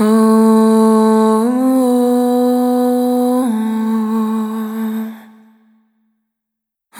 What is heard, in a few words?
Single Voice Melodic Loops